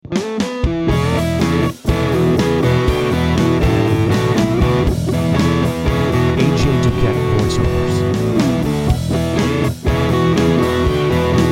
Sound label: Country Rock, music beds for voiceover